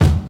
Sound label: thick kickdrum sound e key; kick drum samples